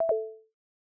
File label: go back or cancel